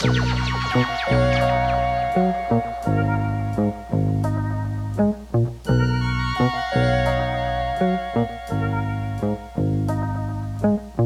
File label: Euphoria Melody; Cymatics